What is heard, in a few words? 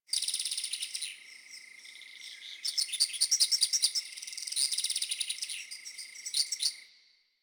Night Insect Generic; Ambience; One Offs; Insects; Warframe; Quests; The New War; Drifter Camp